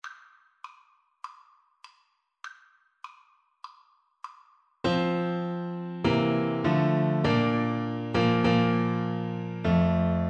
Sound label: nearer my god, Tuba